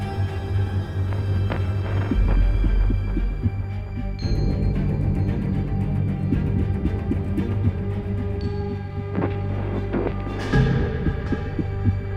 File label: Space Second corr long loop, Music; SpaceshipDemo